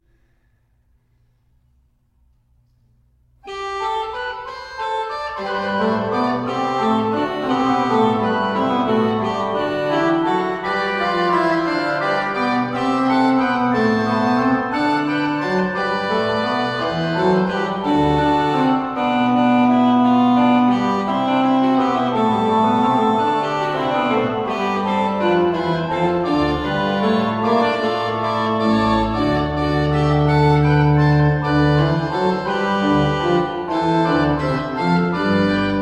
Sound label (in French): Kyrie Trio a dessus de Chromhorne et La Basse de Tierce; Francois Couperin; Messe a l'usage des Couvents